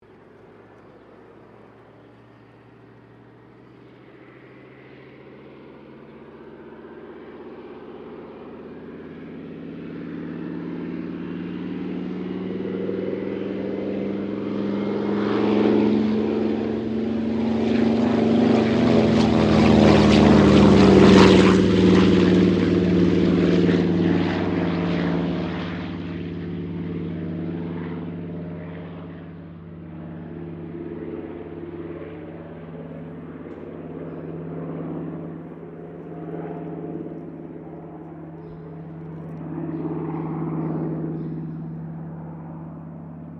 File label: AIRCRAFT CA-13 BOOMERANG TAKEOFF; Airplanes (Sound Effects:Vehicles)